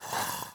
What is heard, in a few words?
spitting zombie prepare; Hollow Knight